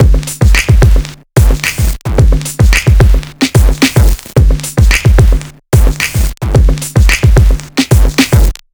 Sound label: Lo Fi Kit B, electronica